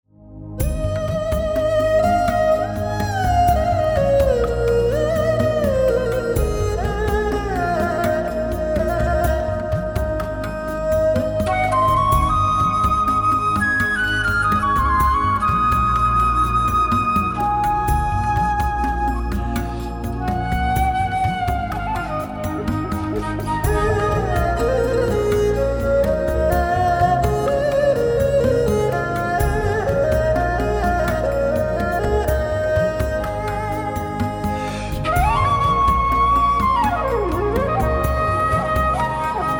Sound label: Flute